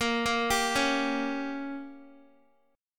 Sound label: A sharp Minor 6th A sharp, Guitar 4F,4A,5C,5G,5C,6E; A#m6; arpeggiated; x,13,10,12,13,x